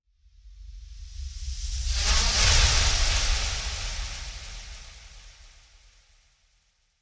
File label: Super speed dash fading echo